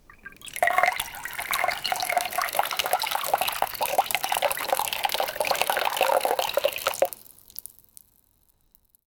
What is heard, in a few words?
pouring water on head; well